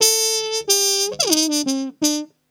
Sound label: ACID JAZZ; Best Service Advanced Media Trax Modern Composer